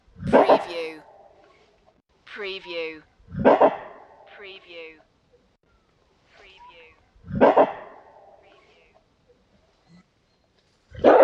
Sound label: Gorilla Barking Echoes